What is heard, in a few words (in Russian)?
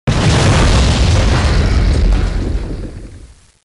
podorvali karier dinamitom